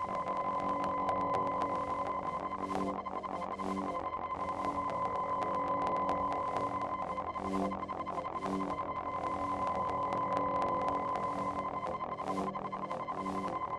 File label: static holo sign; angel city; Titanfall 2